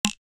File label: Disconnect; Nokia N79; Audio themes